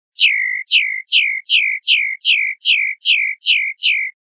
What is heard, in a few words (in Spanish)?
rep golpes